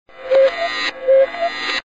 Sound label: cuckoo clock